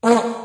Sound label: Vuvuzela